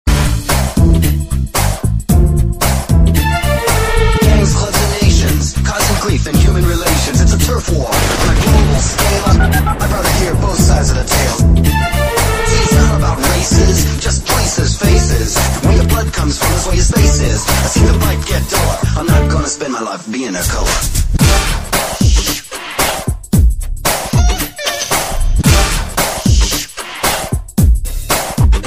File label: Beat